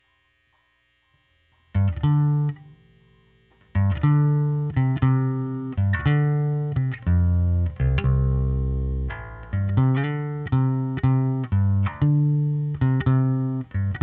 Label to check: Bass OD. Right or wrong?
Right